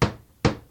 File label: chop chop; boomslang